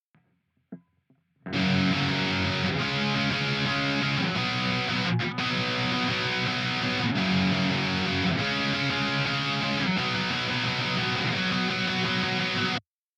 guitar off

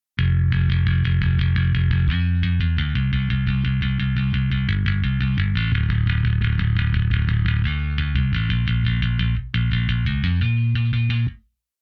bass off

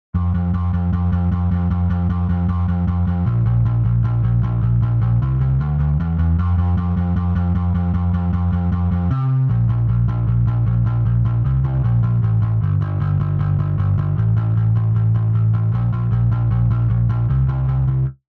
Distortion Bass On; MannyM

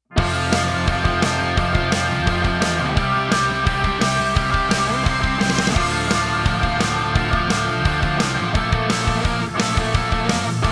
low end focus off

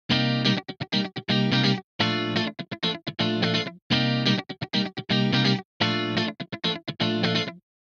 guitar Adt Off; Reel

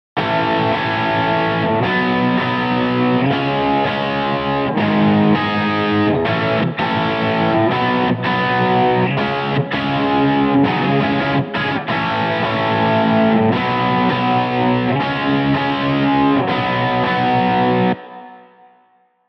screamer on

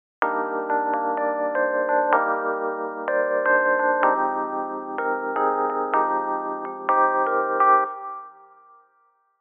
key on

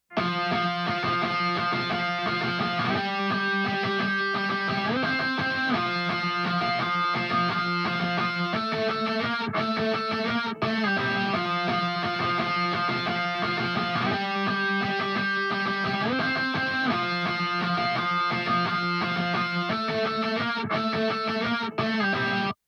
guitar on

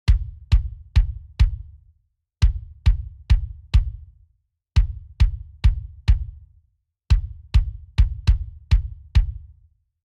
smack attack sustain off

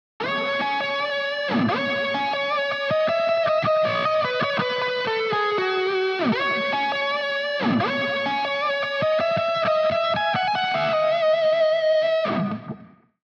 delay Effect On

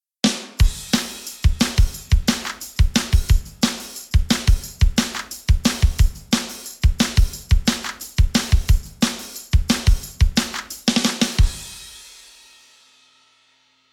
drum off

